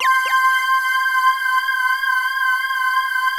SYNTHESIS; Pro-Rec - Synthsonic; JV FILM

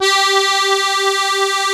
ZUPAN ZUG G1, AKKORDEON; Best Service; Alpine Volksmusik

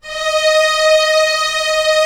STR D#3 FF R, STRNGS, Orchestra; Sound On Sound - Peter Siedleczek's Orchestra

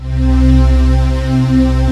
SYN STRING; Rubber Chicken Software Sample Bank

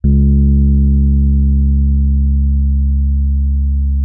MK1 C#2, RHODES; Xsample VOL-1

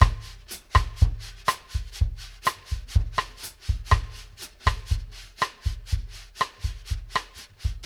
BRUSH SIDES, bossa 120; Sampleheads; New York City Drumworks VOL-1; KD BOSSA 120

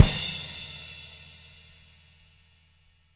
CW KIK -L, Living Drums, SD CYMBALS; Sampleheads; Peter Erskine

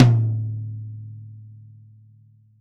tom, velocity tom; VELOCITY TOM 1

